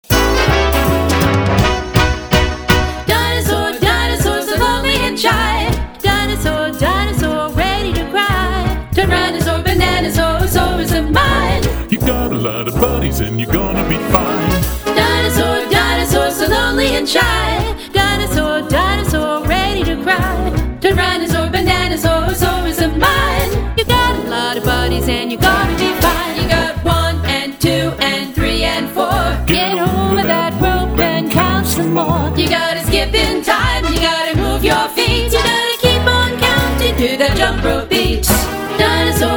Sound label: Jump rope jive